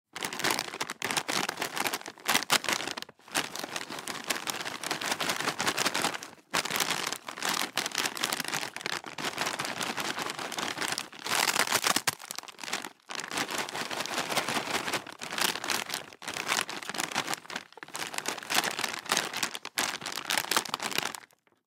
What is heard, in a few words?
Pack of chips